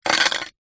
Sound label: badges metal x3 set down table